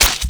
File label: sticker slap; Tony Hawk's Project 8; XBOX